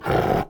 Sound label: Wildcats Tiger Roar